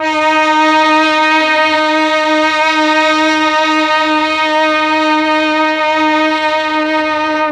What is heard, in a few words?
MEL STR, Legendary M400; ILIO; Propeller Island